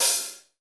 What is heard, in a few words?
CYM 14, Real HiHats, 14 Hi Hat